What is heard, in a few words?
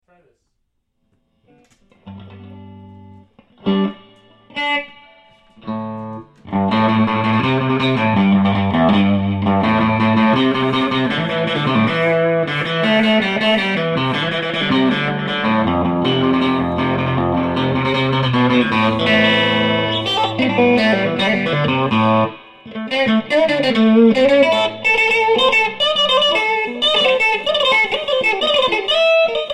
loud noodling